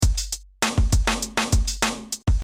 korg drum

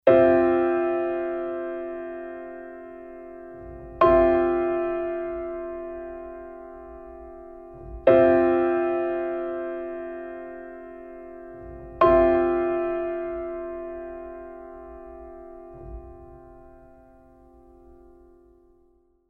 Cadd11

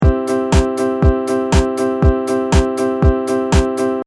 piano long; logic

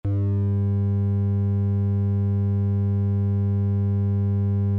Sine; UAD

